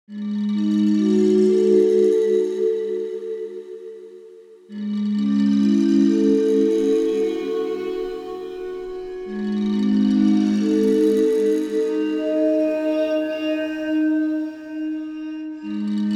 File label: waterphone; Muzyka Ilustracyjna